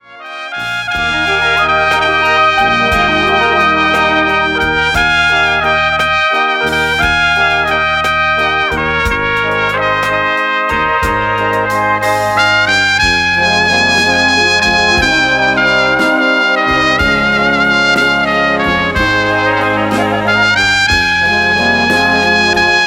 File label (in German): Blaskapelle